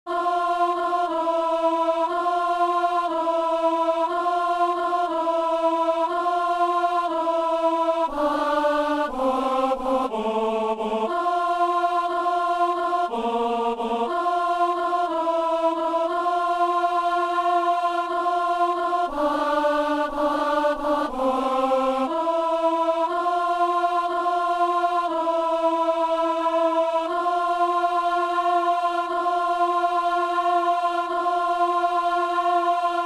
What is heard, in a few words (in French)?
messe simple, gloria, partie d seule, alto